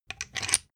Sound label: thread screw remove